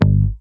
bass mute pick G

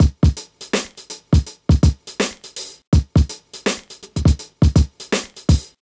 82 Full Drum Lp

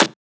Snare

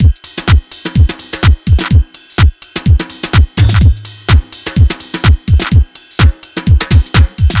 Drum Loop Full 126

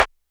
Clap Snare

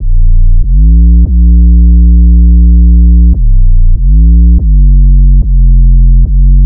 144 sub bass A-m; eldritch

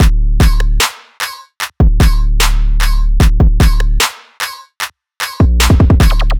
150 Heavy Trap Beat

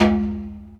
PERC

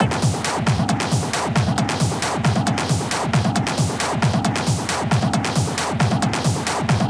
135 Perc

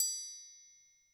Triangle Hit Overhead

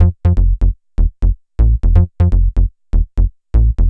123 BASS Fm

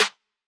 Snare

